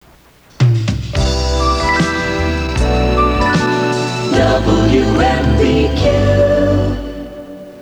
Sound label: radio jingle; wmvq